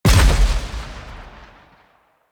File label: Weapon Punch Arch Dual Pistol; Warframe; Weapons; Heavy Weapons